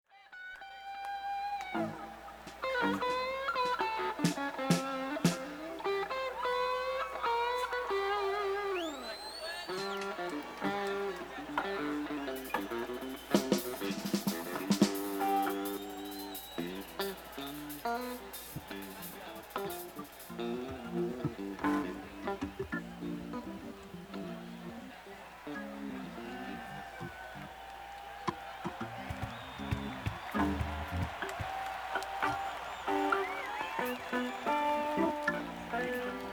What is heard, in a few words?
Tuning